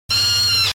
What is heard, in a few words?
scream